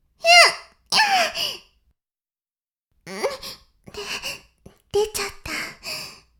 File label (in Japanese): しこしこ音声, ASMR; 白くて黒い魔性円環